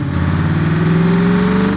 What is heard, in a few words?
second, vehicles; courier truck